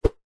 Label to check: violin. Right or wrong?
Wrong